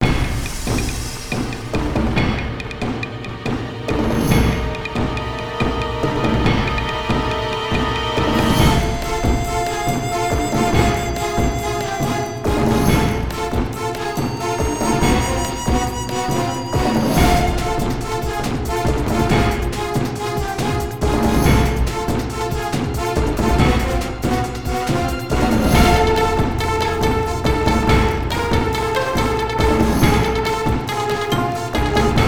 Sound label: Asian Intense; Xiaolin Showdown; PS2